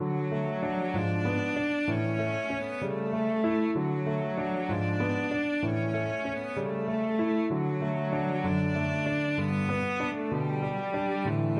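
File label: violoncello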